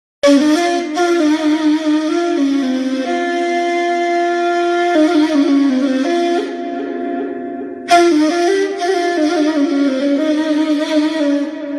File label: music instrumental ney